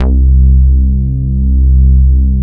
BASS, Drum & Bass, 180 BPM; Ueberschall; Drum & Bass Flash Trackz

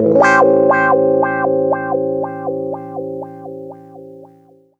120 WAH PAD, Electric & Acoustic Guitar Loops; USB Soundscan vol.04; AKAI

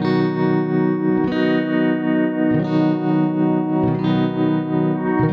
Lap Steel Clean 90 B, guitar drone

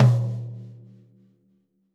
floor tom - snares on; JazzFunkKit

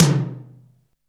R8 Tom, Roland R-8

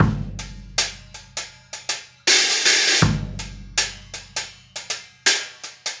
Hi Hat and Kick

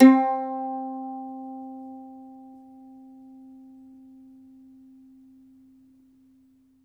MANDOLIN, Plucked Strings

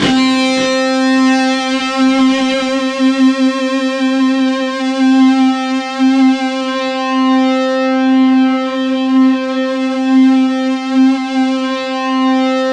C3, Synth; 90 s Sample CDs; NiceBeats; SynthLine VOL-15

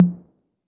Roland808 HT

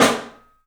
PIC SNR, PICOLO ROOM; 3D Sound

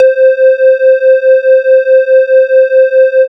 syn, Techno; Techno ID

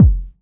Kick, Kickdrum; Equipped Music; Loungin House

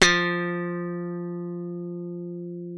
F3, slap thumb; Xsample VOL-1

